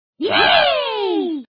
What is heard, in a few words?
yay; toad